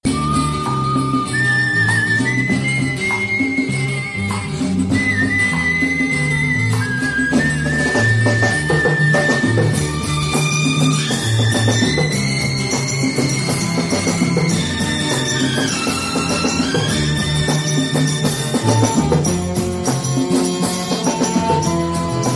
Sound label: MOVIE THEME INSTRUMENTAL RINGTONE; SHOLAY